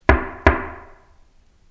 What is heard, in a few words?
knock; 扉ノック; 効果音素材